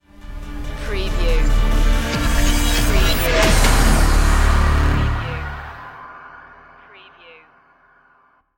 binary clash stinger

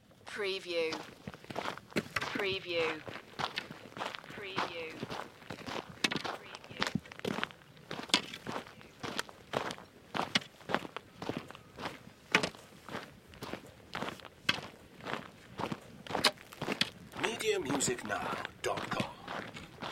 Footsteps, two persons walking on gravel

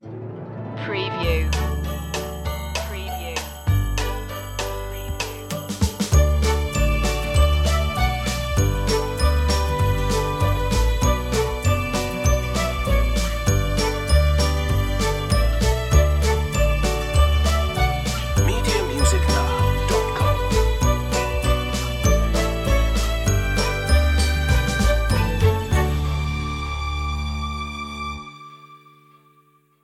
soulful christmas

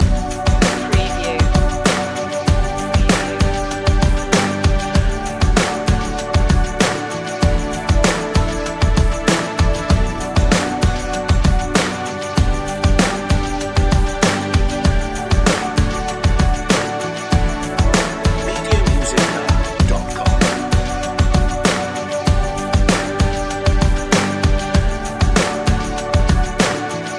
petra k loop

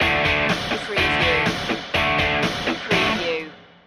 garage band loop

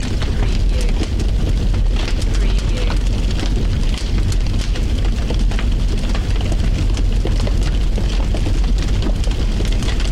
Forest Fire Loop

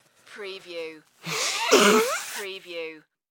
Nose Blow Tissue

